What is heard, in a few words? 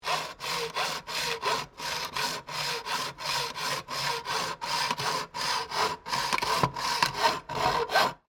Industry, Hack, Saw, Into, Sheet, Metal; Saws; Machines; Blast Wave